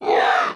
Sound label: Siren Noises; Playable Tutorial Level